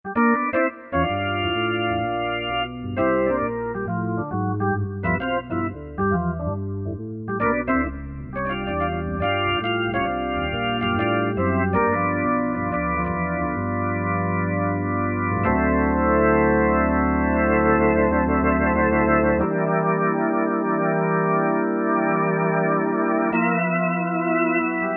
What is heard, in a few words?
Medium + RS, Korg MSC-9S Organ